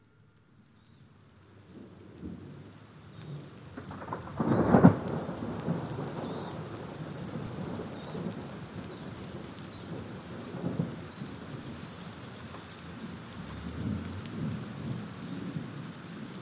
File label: Nature Soundscape